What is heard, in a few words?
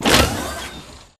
Sentry Turret Leg Servo; Boost Card; Titanfall 2